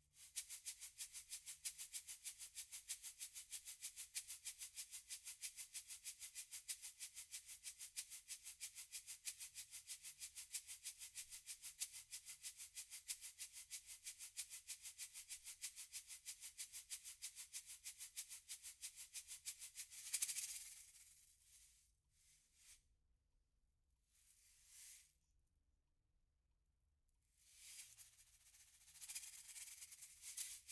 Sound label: Shaker, 94bpm